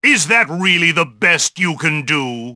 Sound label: JEER; Bully SE; Crabble